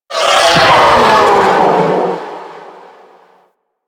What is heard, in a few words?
Sentient Vocal Death, Enemies; Warframe; Sentients; Ortholyst